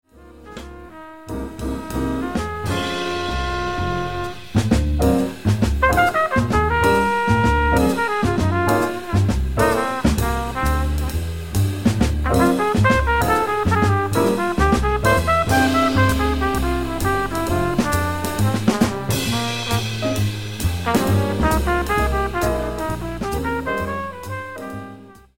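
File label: trumpet solo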